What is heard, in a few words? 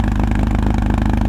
Loop, Engine, buggy